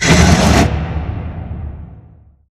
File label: sliding door lg verb close slide; Titanfall 2